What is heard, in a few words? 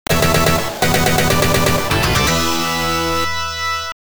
Pattern Clear No.1 (Stage Clear 1); Super Contra; arcade